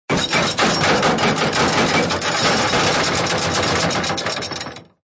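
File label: anchor sounds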